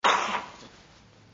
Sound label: Choke; Lewd SFX